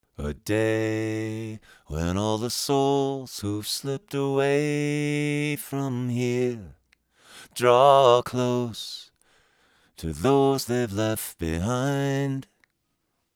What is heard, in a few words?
pitch down 1 fifth; retune